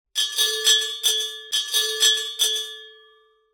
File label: bell; Inquisitor